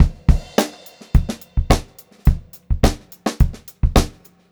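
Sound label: 106 groovy variation 2, funk groove drumloops; USB Soundscan vol.38; AKAI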